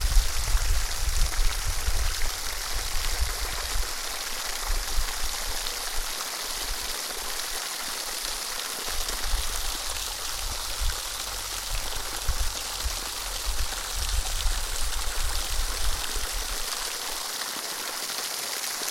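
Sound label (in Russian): небольшой водопад в Доломитовых Альпах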